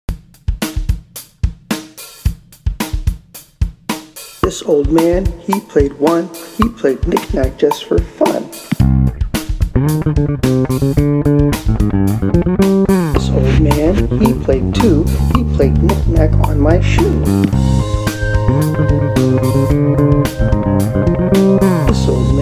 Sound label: Rap scape This Old Man 2 Bar